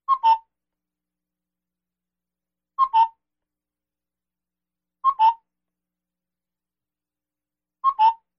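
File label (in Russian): часы кукушка; Chasy kukushka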